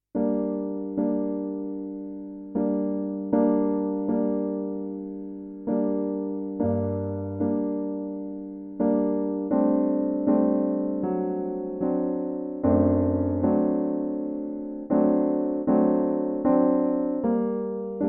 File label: Aria piano